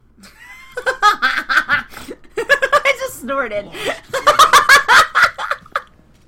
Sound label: snort